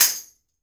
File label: toy tambourine